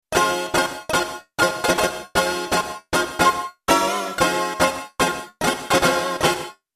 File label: Black Feelin Gtr